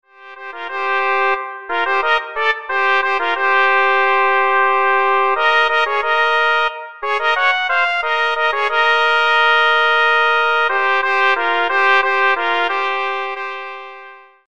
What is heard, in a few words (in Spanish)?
anuncio llegada novio trompetas; repertorio misas de matrimonio